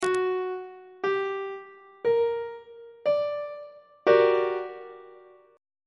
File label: f double sharp minor major 7th 3rd inversion on treble clef